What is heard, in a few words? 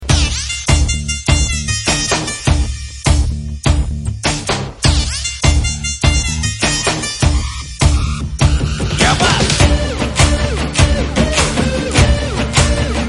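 background music beat tone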